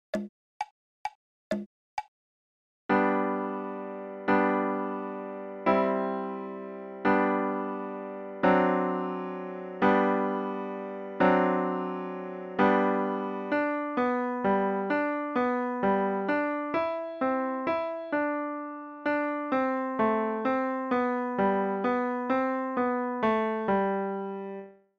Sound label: Good Morning In G LH